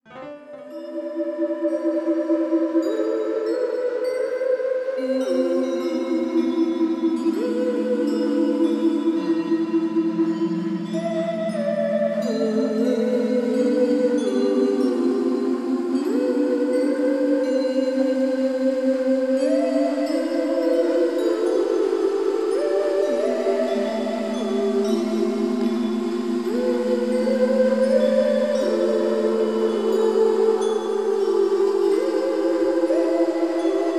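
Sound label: theremin; в моем исполнении на терменвоксе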